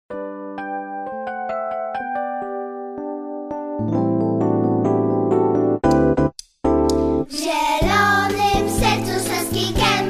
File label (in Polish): hymn; hymn saskiego zakatka; hymn przedszkola